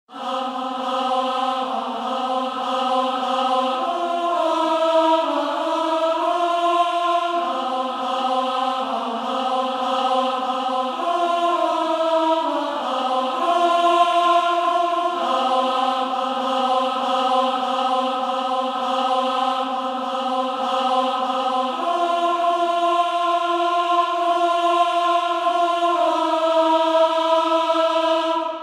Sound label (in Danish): Seniorkor Alto; Herlev Seniorkor